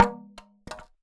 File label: Polystyrene Rattle